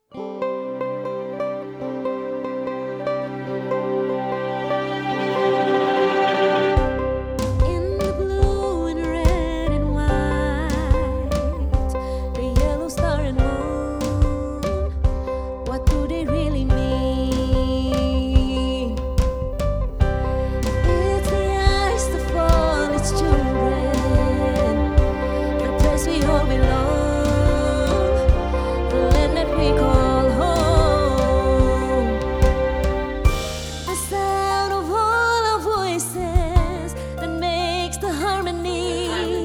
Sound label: Orchestra